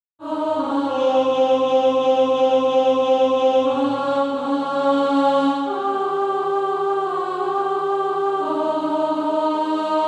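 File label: alto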